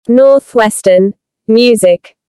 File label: Jingle; logos